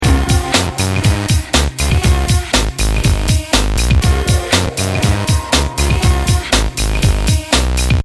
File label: loop